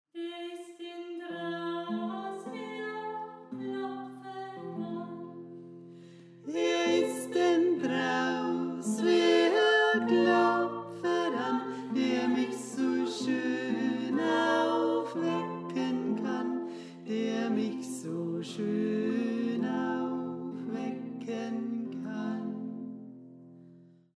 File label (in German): Tenor; Wer Is Denn Drauß